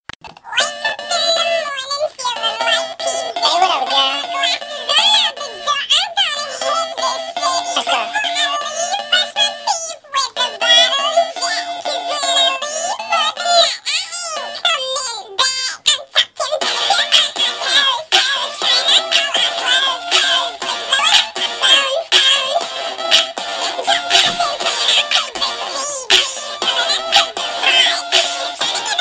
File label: Chipmunks